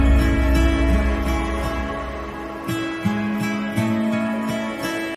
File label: Honeymoon Ringtone